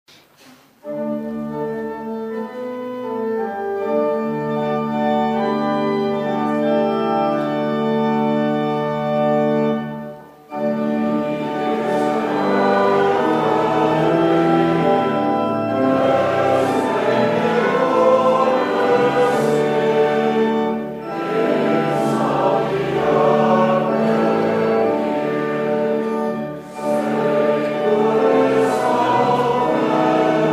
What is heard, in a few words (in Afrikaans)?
Gemeentesang